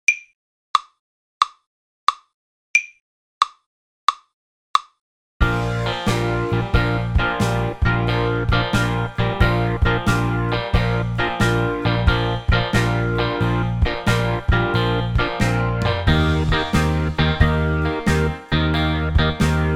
12 bar Blues Bb

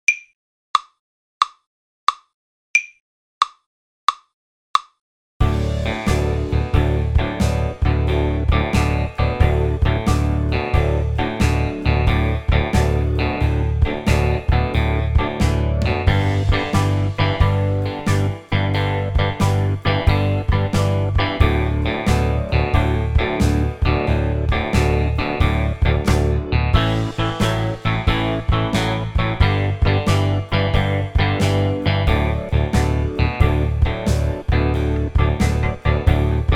12 bar Blues D

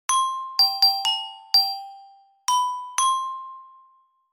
Closing Riff

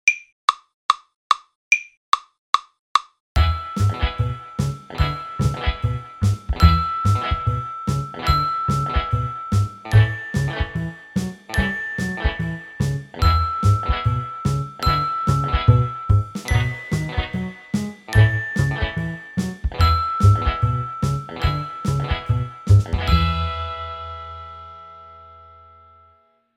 12 Bar Blues in E

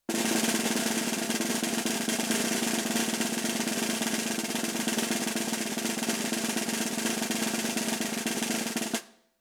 Buzz Roll

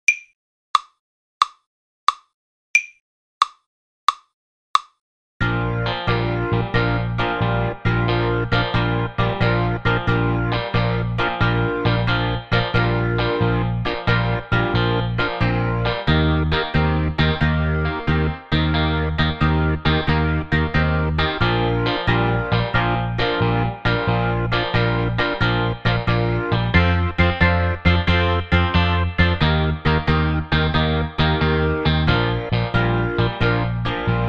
Shuffle Groove

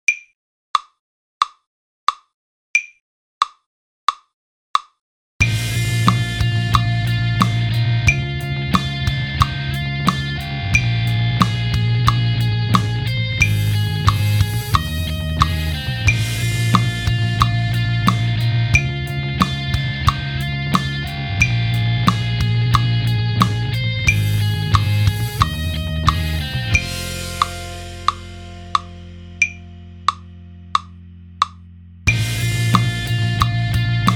More 4 bar Phrases @90; A C

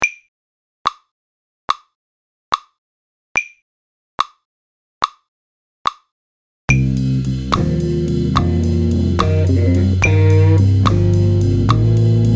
Blues Grooves

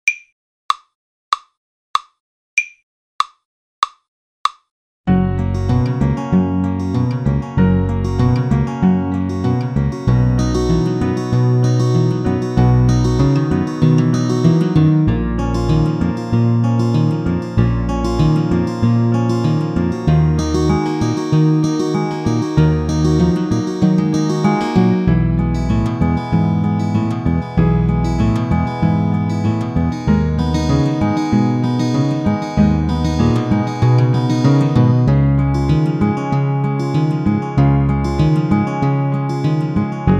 Kit Solo I

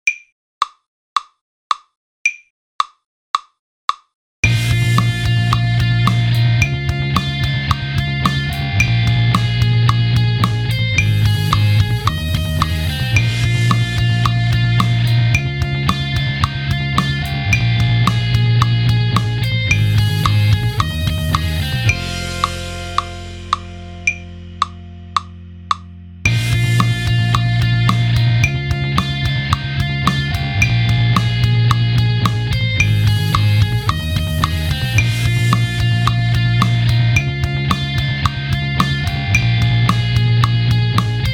More 4 bar Phrase @110

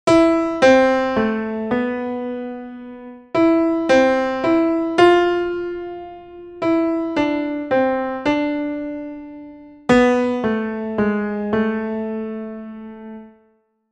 Simple Triple Time

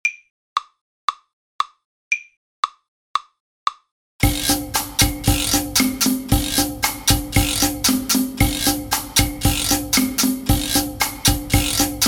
Latin Guiro Play Along

